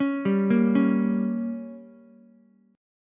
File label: G Diminished, Ukulele; arpeggiated; JGuitar